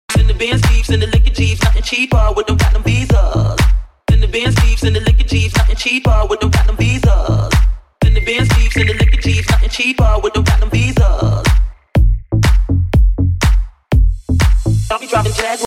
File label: Indie Dance; Jump Up